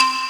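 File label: REV xylophone; AKAI S-Series CD-ROM Sound Library VOL-8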